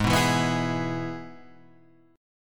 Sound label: G sharp Minor Sharp 5th, Guitar, Standard; G#m#5 strummed; JGuitar